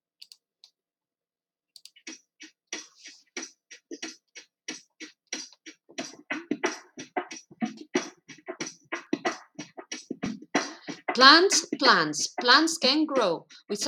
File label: CHANT Organic Garden